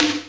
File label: Snare, Dubstep One-Shot Drums